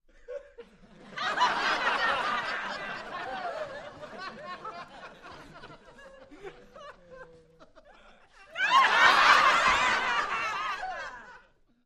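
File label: Crowd 50 Single Hearty, small interior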